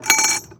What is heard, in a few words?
Moody Park Chimes Perc; Houston; percussion